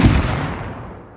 fake minicopter crash; rust

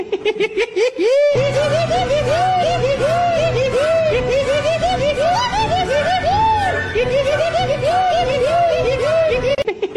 in the hall of the mountain king hee hee

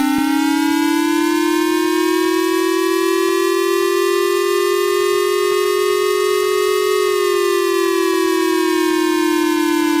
missile alert

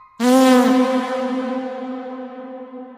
farting of mic

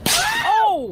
slap, ohhh

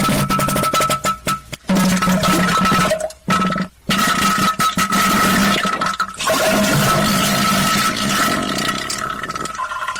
nuclear diarrhea

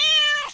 cat meow

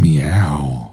meowwwwwwwwww

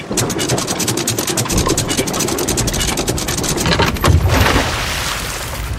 anchor drop; sea of thieves

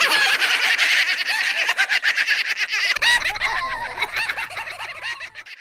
cockroach laugh

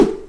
whoosh clean

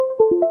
usb connect; windows 10

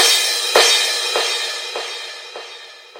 normal mode; owakcx sprunki

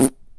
quick fart

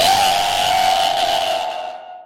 jumpscare sound; one nights at flumpty s

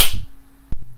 ruler slap; baldi

